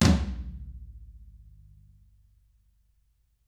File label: TAMA TOMR, flam; ILIO; Double Platinum Drums 1